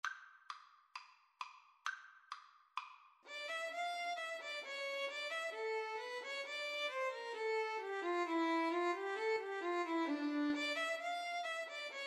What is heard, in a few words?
bourree, Violin Viola Duet; bach